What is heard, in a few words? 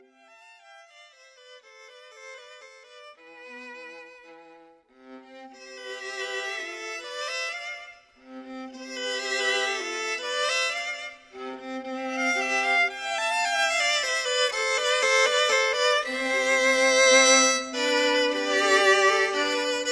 duo flower duet; delibes; Lakme